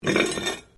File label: METAL ON STONE SCRAPE; Metal (Sound Effects:Materials)